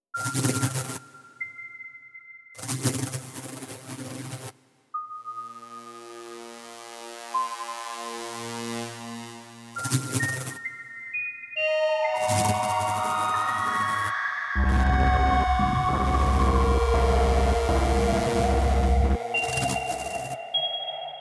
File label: Noise FX Ambient; Jumbly